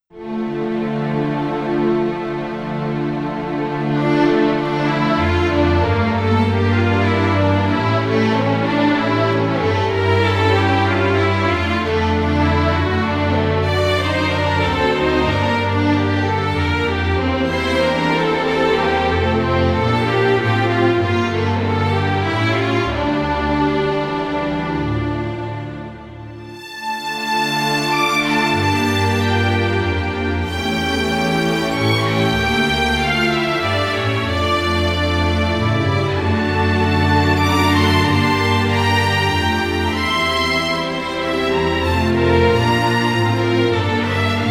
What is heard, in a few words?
Bartimeu (instrumental)